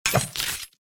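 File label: KATANA SWORD normal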